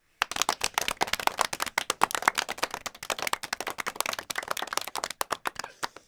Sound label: clapping